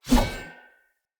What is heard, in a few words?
Short Sword Heavy Whoosh; Weapons; Player; Swords; Sollos